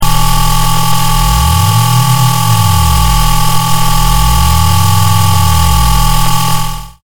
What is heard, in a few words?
Train